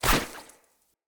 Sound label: lantern net break; Objects; Ode Lantern Crate